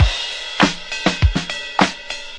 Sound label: i got the clap, DRUM LOOPS and BREAKS, 100 bpm; 091 to 100 bpm